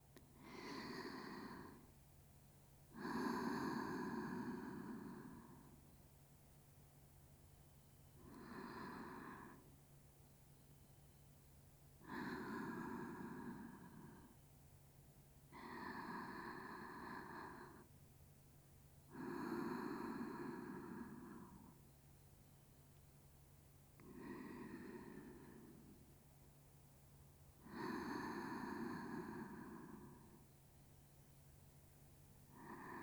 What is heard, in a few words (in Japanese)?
寝息 (左側); まほろば庵 秋葉1